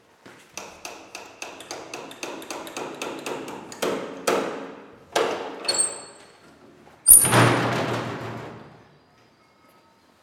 Metal Door Open

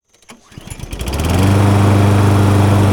Lawnmower Startup

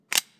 Gun slide

